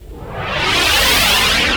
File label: NOISE, Production Effects